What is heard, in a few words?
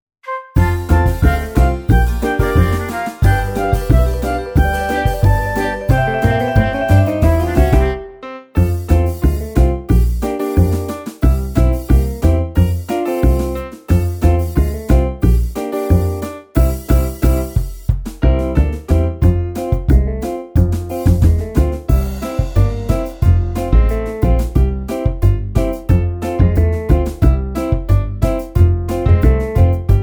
Karaoke